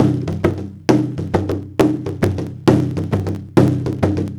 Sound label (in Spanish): pandero